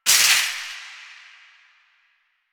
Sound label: Shopping Cart Hit; Warframe; Ambience; Nineteen Ninety Nine